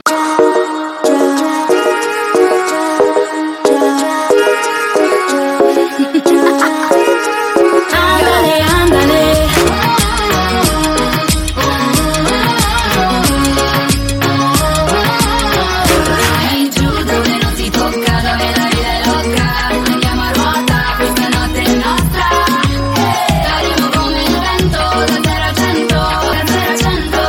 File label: Italian Ringtone